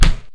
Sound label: gun hit wall